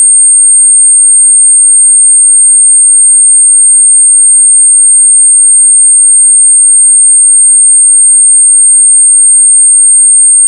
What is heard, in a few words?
average female most uncomfortable sound; gender research